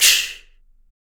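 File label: CHINA CRASH, MALE PERC; Spectrasonics; Vocal Planet; Jazz FX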